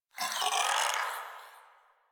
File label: Shadow Vocal Idle; Warframe; Duviri